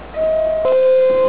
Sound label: subway